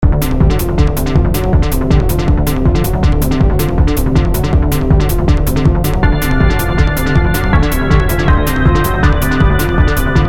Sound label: chase